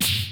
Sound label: FUNK, DRUMS; Kid Nepro; Elite